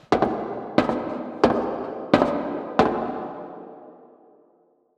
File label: rift event footsteps; astral planes; rifts